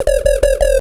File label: Korg Prophecy hits, arps and fx